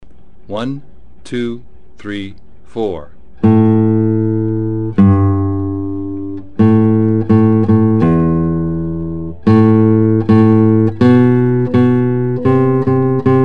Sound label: Hey Ho Nobody Home, gitara